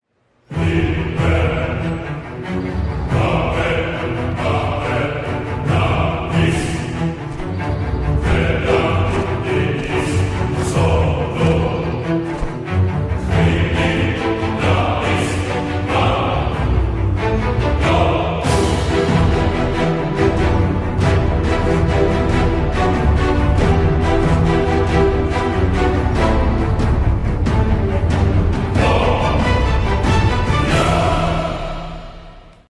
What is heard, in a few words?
Music; WoW Battle for Azeroth; BlizzCon 2017